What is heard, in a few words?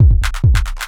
4 floor beat, trance